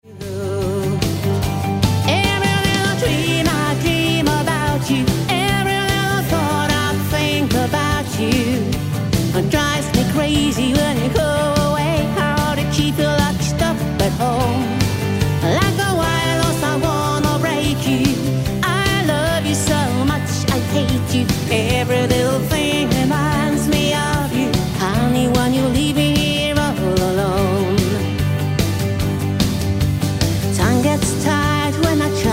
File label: country parlor